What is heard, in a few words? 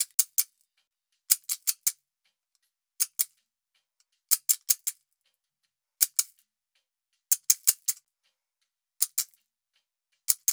shaker A 080, Hip Hop India, 080bpm; Hip Hop India Modern Percussion; Apple Loops